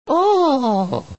Ohhhhh; Mario; Super Mario 63

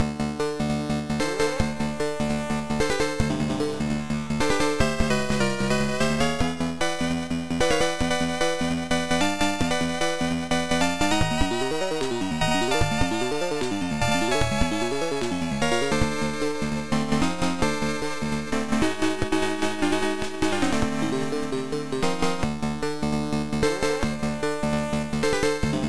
Beat Back (Stage 2 BGM), MSX SCC; Nemesis

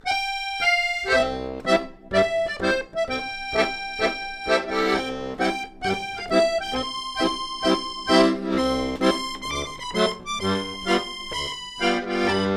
Accordion; Assassin's Creed Syndicate

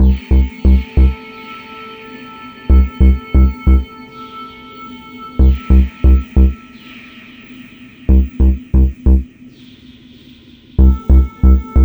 ambient; the warriors 2005 ps2

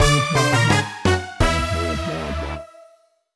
Item Minigame Lose; Mario Party Superstars

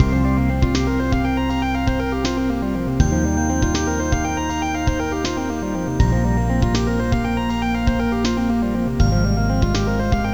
Puzzle; Autoescuela Trainer; nds

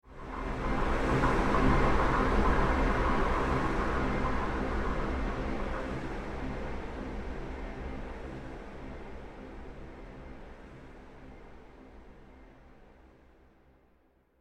Combat Stinger; state of decay lifeline

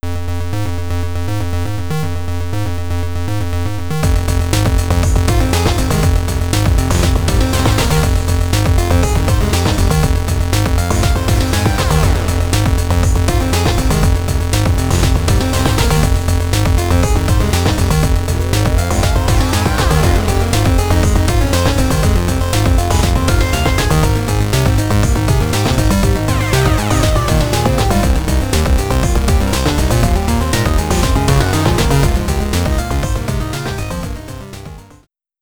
Dead Ops Arcade - Loading Screen; Call of Duty Zombies; Treyarch 2008

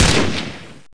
fire 5.56mm; SAS Zombie Assault 4; android; macos

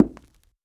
walk wood

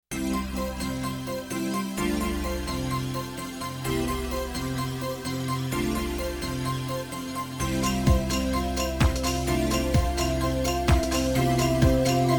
take the snow train (cheering version); NiGHTS into Dreams

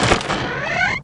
barn Door Open

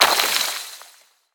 Shatter Sweetener; Boomerang Fu

